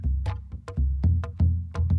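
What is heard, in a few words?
Calm Far, music; from dust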